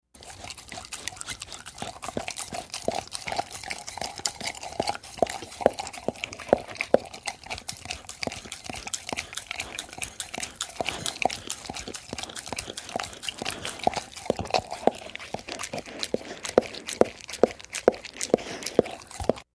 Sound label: Dog Licking Drinking; mvmt